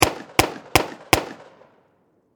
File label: Warfare Pistol 45 Springfield Four Shots Distant Perspective